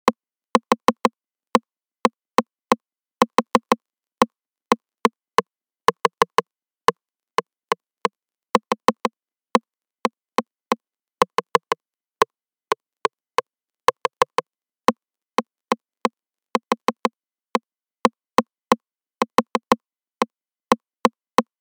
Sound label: RS909 Accent